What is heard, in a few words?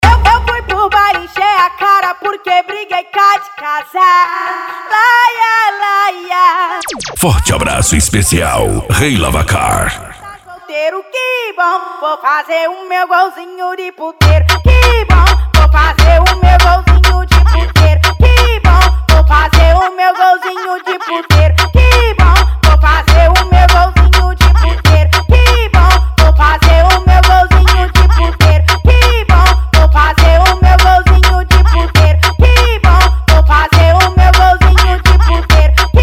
funk mega bass